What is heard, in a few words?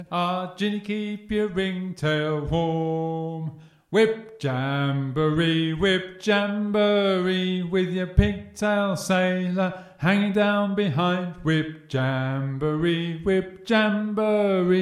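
Whip Jamboree - Tenor, sea shanty singing; eccleshall singathon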